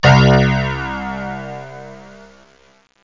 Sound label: fx piano; Sounds Terrific II; Weird Science; pianos